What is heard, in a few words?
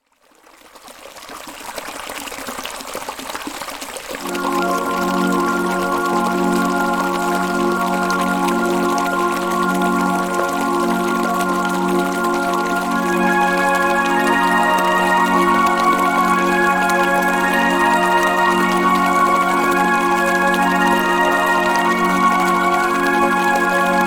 Nature Sounds and Healing Music in the Forest